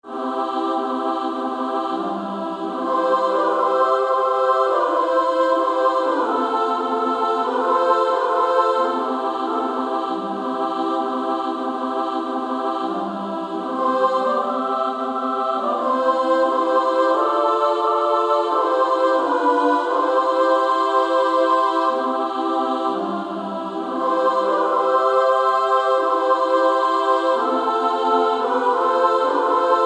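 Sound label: soprano soprano alto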